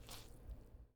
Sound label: Blood Spatter Squelch Near Mono